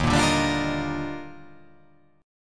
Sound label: D sharp Major 9th